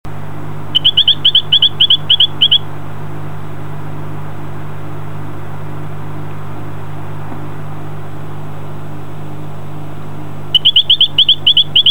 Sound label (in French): A zaparo, chants